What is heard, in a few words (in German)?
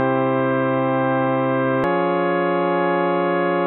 c des gleichstufig